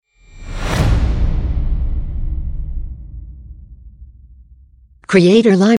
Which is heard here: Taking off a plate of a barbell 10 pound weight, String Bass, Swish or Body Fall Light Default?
Swish